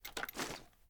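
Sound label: reload empty movement; gmod; viper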